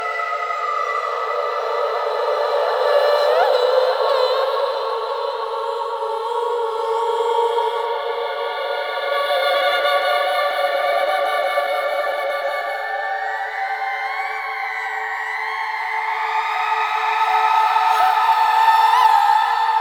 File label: Angel Song Calm; Zariman